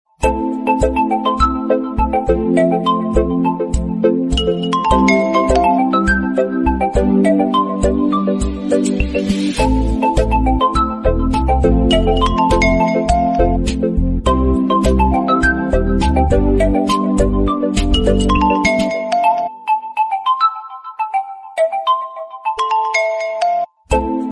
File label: Oppo Reno8 Pro Ringtone